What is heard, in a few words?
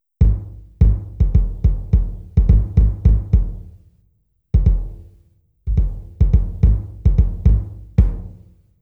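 boom kick, drum samples; Gretsch 22" USA Maple "Boom" Kick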